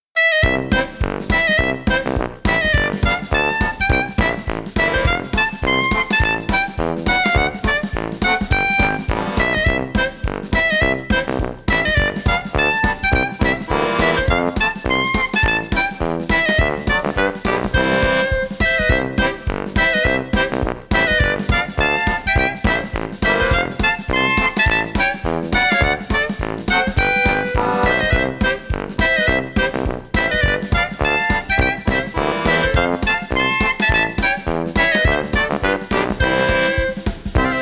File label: pink polka